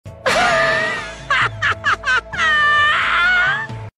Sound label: laugh english; komaeda